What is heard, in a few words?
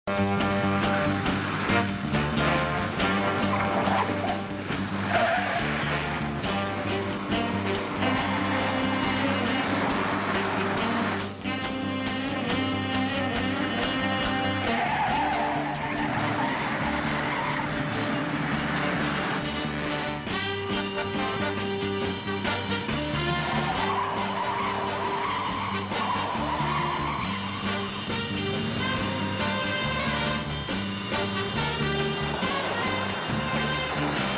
music